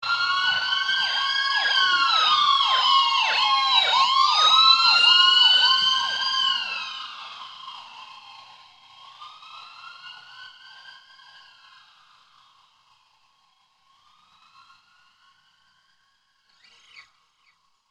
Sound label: Siren